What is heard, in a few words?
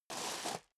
step leather shoes gravel medium scuff; schritte